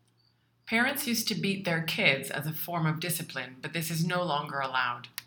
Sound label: kids